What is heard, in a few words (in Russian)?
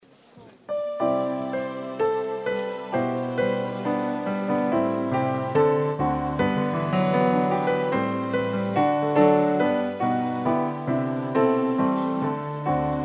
пение Нас Божья благодать хранит; 2016 Апшеронск, Подростковый лагерь